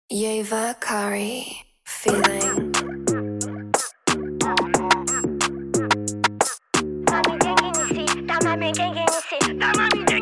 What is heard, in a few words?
Hip Hop Commercial Short Mix